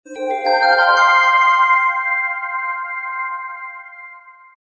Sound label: fantasy bell sparkle